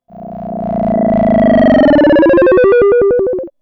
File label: Sci-fi Drone Decelerating